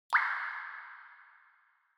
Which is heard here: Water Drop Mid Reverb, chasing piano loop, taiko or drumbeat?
Water Drop Mid Reverb